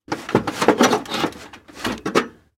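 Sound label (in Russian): ремонт повреждений деревянной крыши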